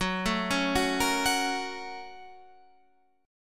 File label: Bb Minor Major 7th Sharp 5th